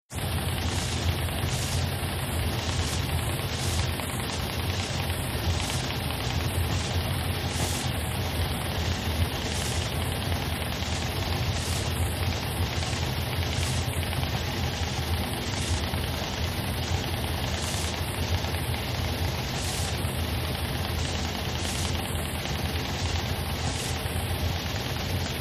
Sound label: Teletypes, airport; CU Miami